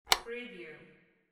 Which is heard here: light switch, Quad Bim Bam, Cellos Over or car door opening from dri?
light switch